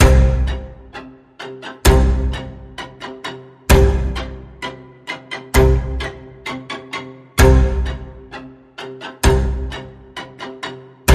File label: Sinister String Pulse C, Key of C